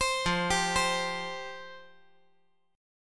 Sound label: F Suspended 2nd, down